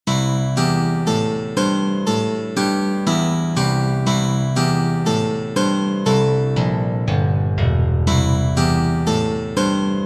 rock and roll; china

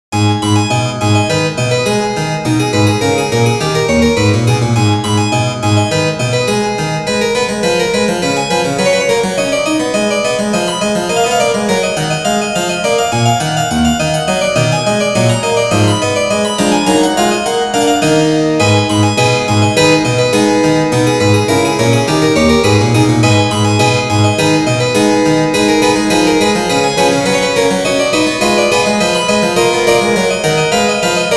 badinerie harpsichord; bach johann sebastian; from orchestral suite b minor bwv 1067

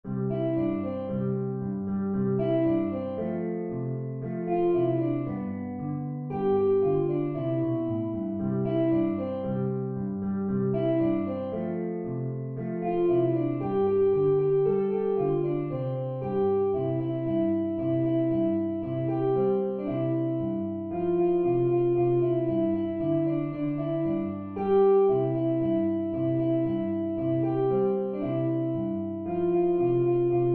jingle bells; pierpont james

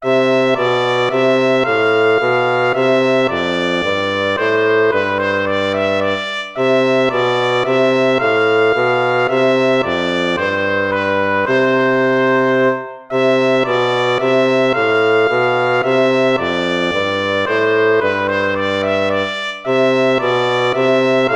tempo minuetto; hook james

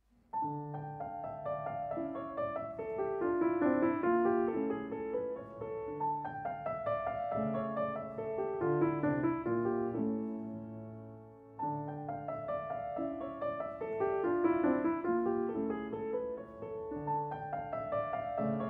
menuet; zipoli domenico

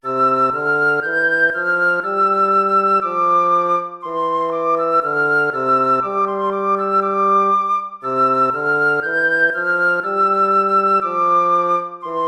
gavotta; hook james

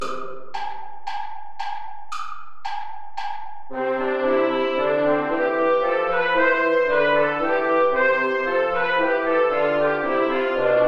air play along without guitar

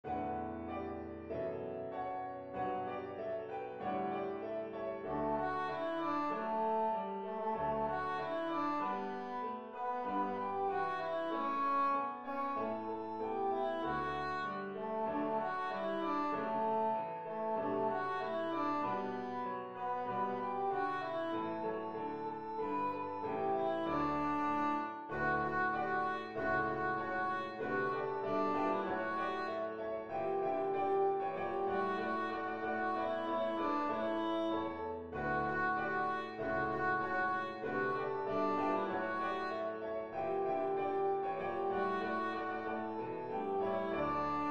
jingle bells

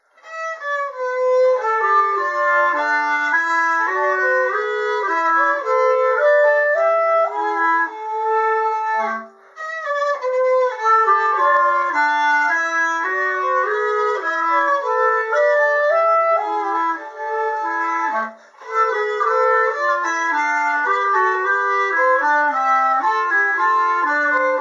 bourree mineur; krieger johann

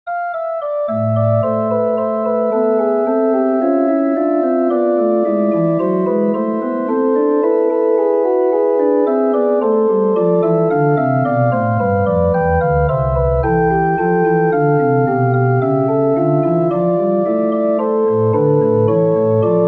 allemande minor for pipe organ; bach johann sebastian

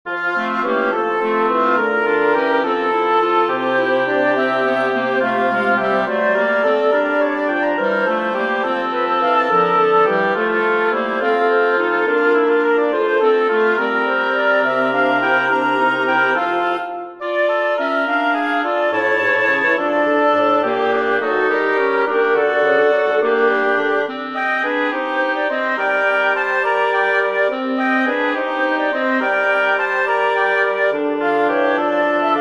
for woodwind quartet; markull friedrich wilhelm; jesus meines lebens leben

